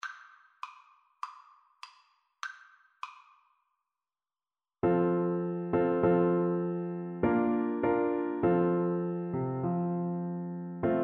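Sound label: vibraphone, Percussion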